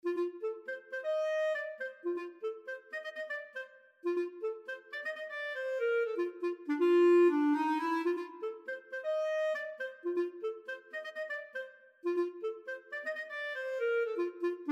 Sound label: Funk Study No 2 clarinet